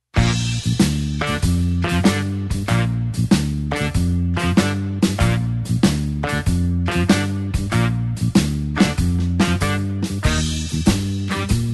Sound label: blues horns shuffle Bb